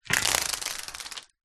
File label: household blind venetian raise; zapsplat